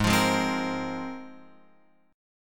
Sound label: G sharp 6th Add 9th, Guitar, Open F; strummed; JGuitar